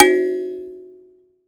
Finger, Prepared Kit; Battery 3 Sample Kits

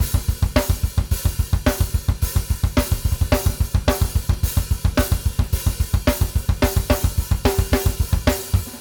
BASIC OPN, Drumworks

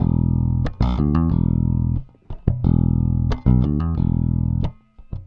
Rock, Bass; Bass X

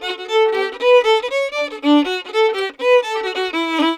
120 D violin, Celtic Flavours; USB Soundscan vol.42; AKAI

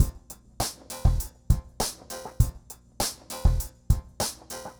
Remixed Drums 100BPM; The Roots Drumloops

